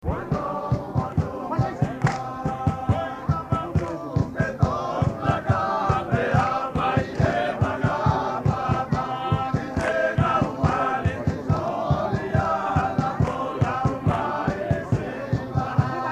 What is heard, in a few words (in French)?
hihifo hommes, wallis futuna